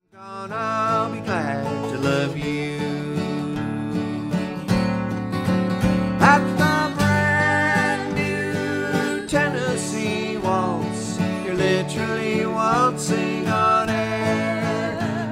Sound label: vocal & guitar; banjo